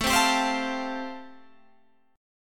A-Minor 7th Sharp 5th, Guitar Open G, chord

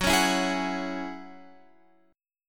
Gb 7th Suspended 2nd Gb down Guitar Open F; Gb7sus2; strummed

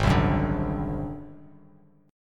Ab 7th Flat 5th Ab down Bass Standard; Ab7b5 strummed; JGuitar

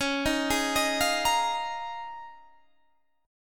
Eb 9th Suspended 4th

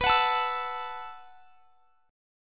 B 7th Suspended 4th B down; B7sus4; strummed

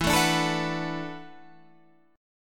E 9th Suspended 4th E down Guitar Standard; E9sus4 strummed; JGuitar

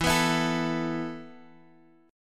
E Suspended 4th E, down, Guitar, Standard; strummed; JGuitar

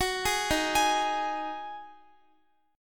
Eb Minor Double Flat 5th, Guitar; Ebmbb5; arpeggiated

